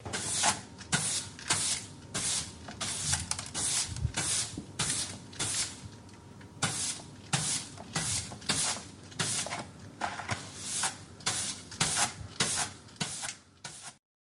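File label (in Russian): быстрые движения веником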